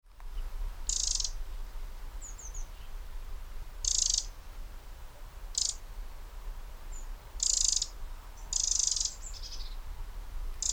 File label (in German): Zaunkönig Erregung